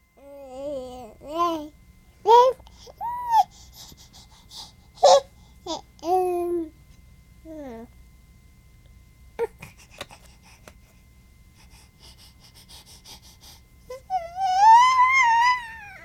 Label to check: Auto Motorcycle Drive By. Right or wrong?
Wrong